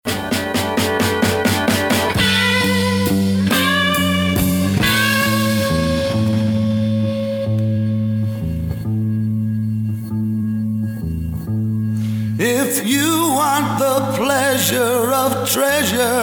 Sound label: Jingles Mini Mix; Chuck Blore Productions; WPIX FM New York